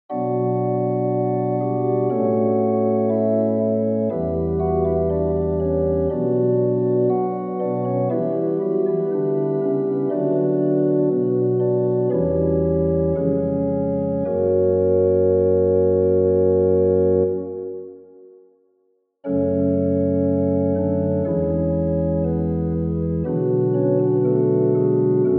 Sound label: chorale in b minor